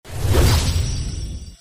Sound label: Slot Msg Total Win